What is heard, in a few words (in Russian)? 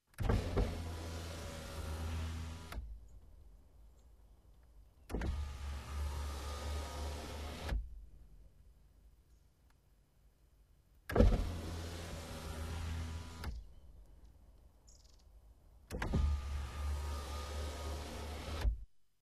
elektronnie okna avto vniz-vverh 2 raza